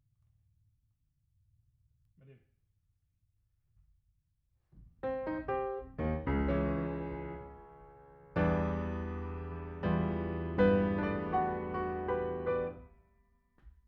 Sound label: Piano Mono